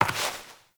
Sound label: Step Dirt; Warframe; Koumei